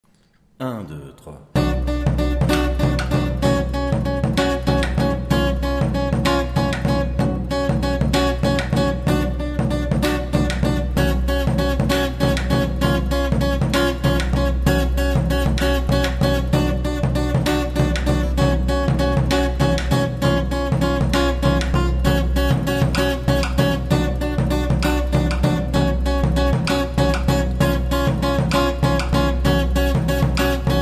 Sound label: percu; Porceaugnac